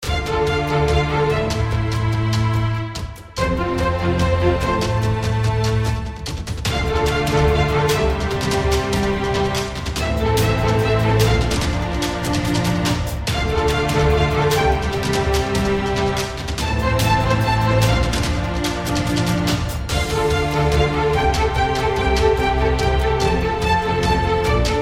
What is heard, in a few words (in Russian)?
muzyka dlya igr